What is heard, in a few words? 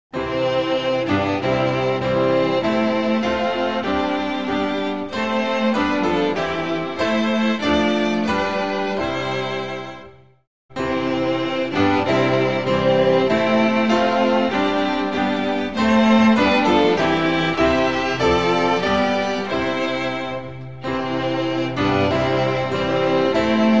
instrumental; hymns spanish